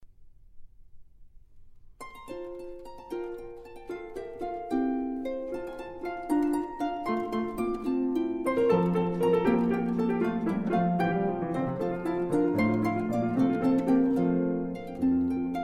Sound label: Harp Piano Grand Duo F Major Finale Allegro; Woelfl; Op29